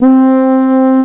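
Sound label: FRHORN C4, French horn; Alone In The Dark Demo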